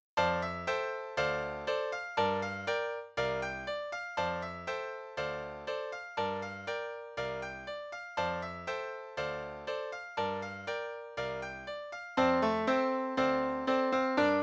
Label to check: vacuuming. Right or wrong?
Wrong